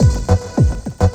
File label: Beats, Synchro Funk